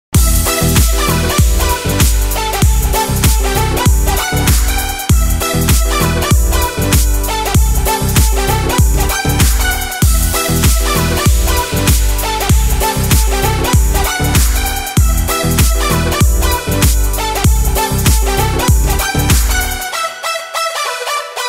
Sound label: BGM korean ringtone